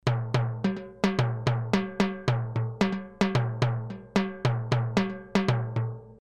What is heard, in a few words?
Mooer Synth Drum Timbales